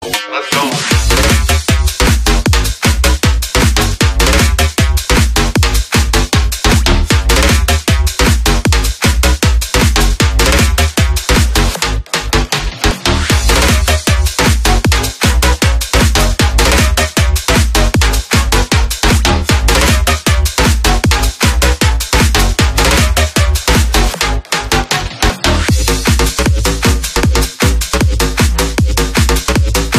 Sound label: Hardbass